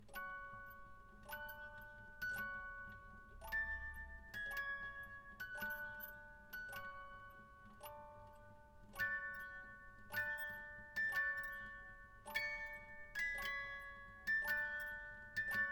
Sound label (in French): Marche Funebre; Toten Marsch; Chopin